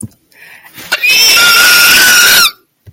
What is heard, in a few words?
scream sound